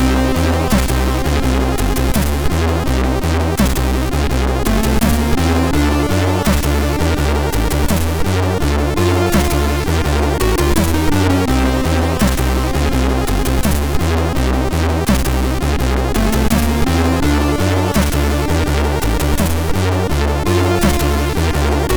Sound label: MOS6581R4, sid; Nantco Goes Plinko